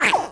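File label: creature womp rat attack light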